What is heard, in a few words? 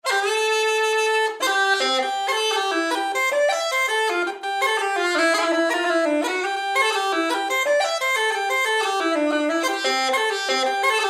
c chanter, uilleann pipes